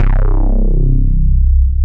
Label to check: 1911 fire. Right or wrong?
Wrong